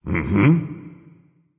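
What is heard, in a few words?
nonverbal agree; smite; voicepack; ullr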